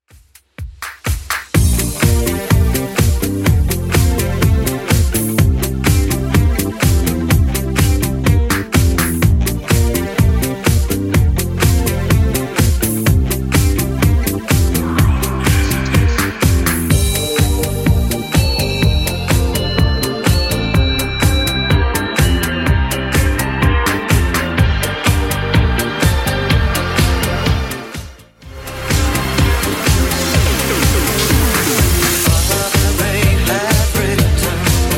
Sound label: 80s Redrum 125bpm